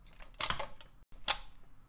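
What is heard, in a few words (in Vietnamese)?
tiếng cắm ổ cắm điện phích cắm điện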